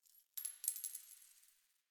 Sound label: Coin Drop